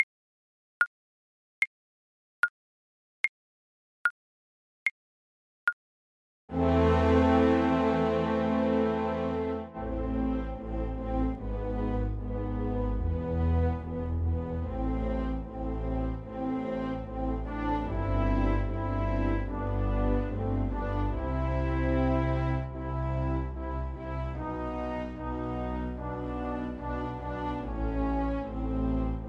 beethoven 7 2nd FL kar, Flute

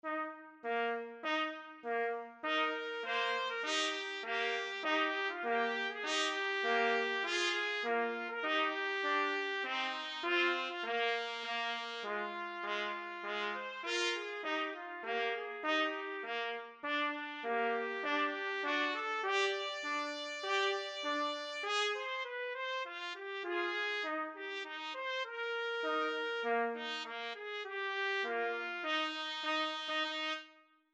torreador song trumpet duet

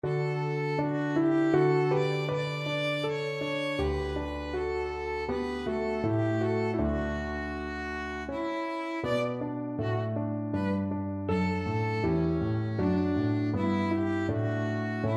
dance, violin

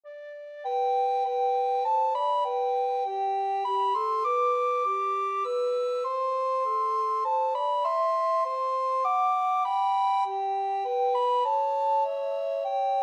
away in a manger, recorder duet

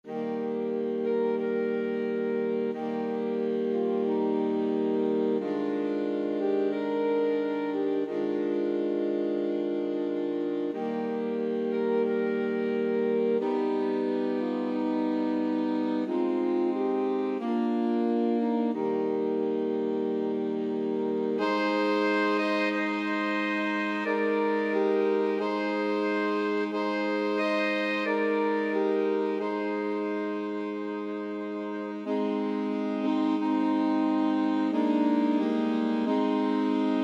saxophone quartet

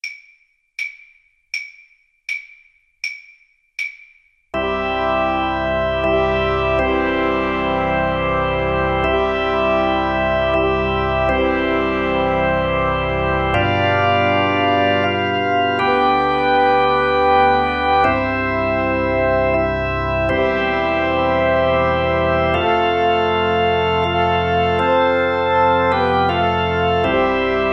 silent night, Tuba

